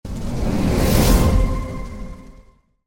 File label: Spell Human